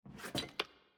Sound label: Footstep Plates Rotate